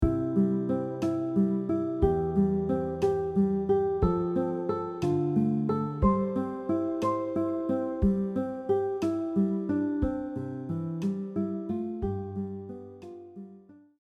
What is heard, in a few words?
guitar; O Holy Night